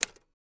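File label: Typewriter Single Key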